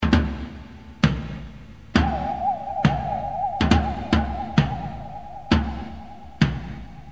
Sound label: ringtone